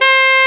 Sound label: SAX A. MF C, Alto Short; Roland LCDP07 Super Sax